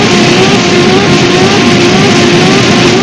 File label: siren steam; quake2